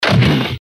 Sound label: short industrial event